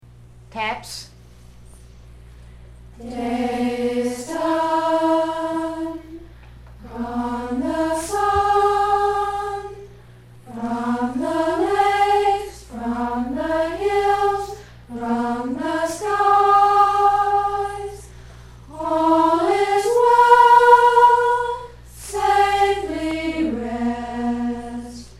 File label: Taps